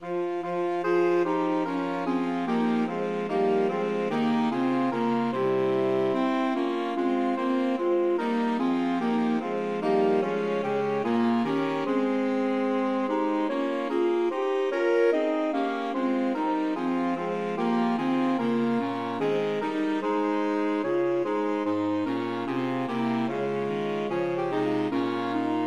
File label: god rest SAX quartet